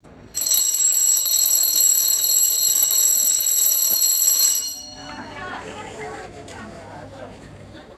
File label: bright metallic school bell ringing; bells